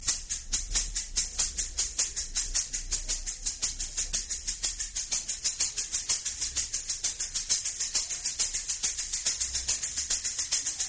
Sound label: Maracas